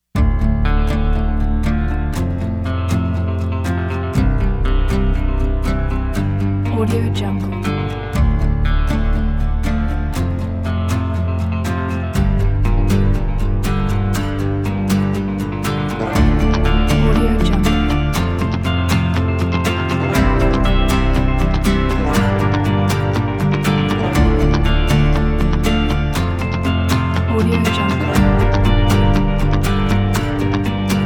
rock cinematic motivation story pack

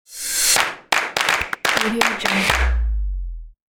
short claps logo

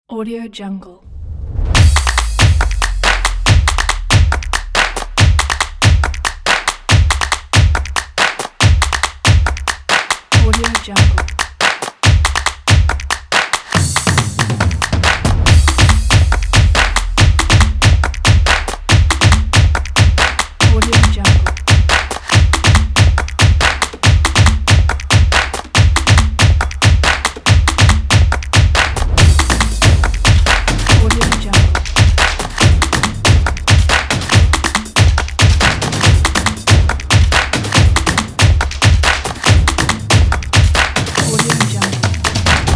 drum on

